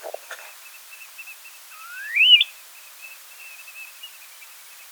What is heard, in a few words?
Snowy plover